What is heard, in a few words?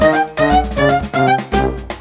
mus cage forcefield; jungle world